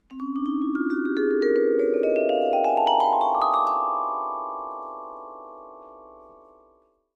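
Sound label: Vibraphone Ascending; mallets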